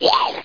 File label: Chomp; Par Building